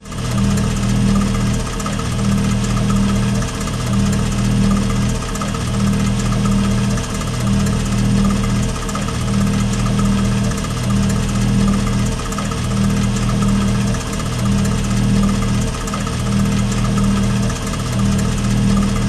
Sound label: industrial conveyor belt operating